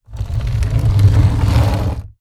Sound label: push coffin lid